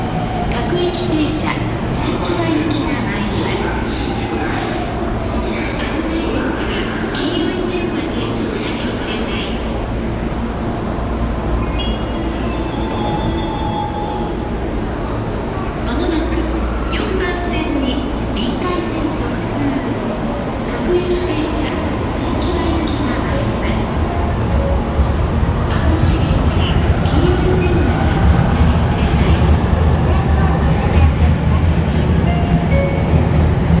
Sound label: Ebisu Station